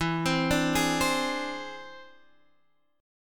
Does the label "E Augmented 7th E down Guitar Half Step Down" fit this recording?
Yes